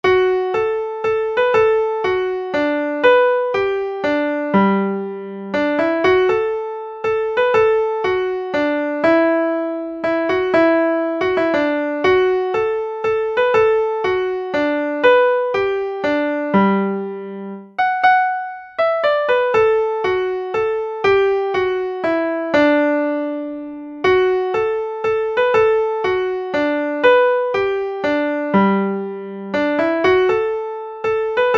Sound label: salamonie waltz